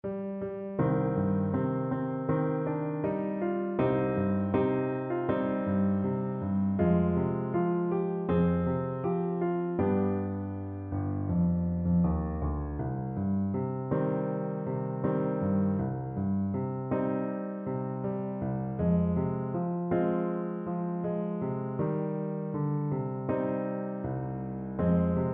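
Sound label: au revoir camarade, alto saxophone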